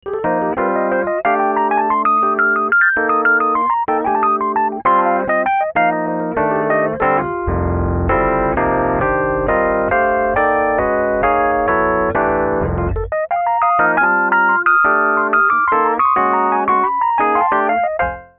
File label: dry high notes, fender rhodes